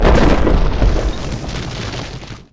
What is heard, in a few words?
Rock Monster Explode; Ruins